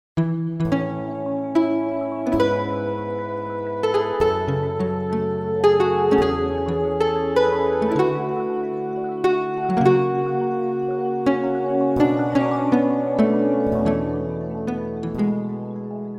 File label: nylon